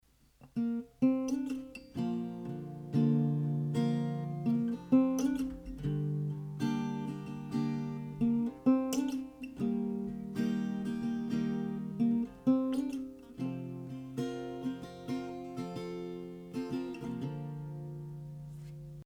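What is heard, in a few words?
acoustic guitar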